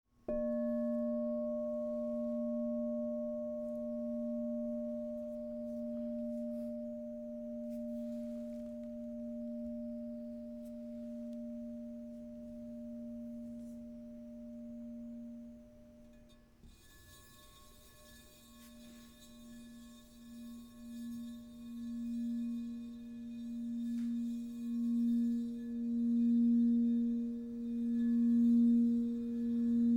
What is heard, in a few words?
Crystal Tones Singing Bowls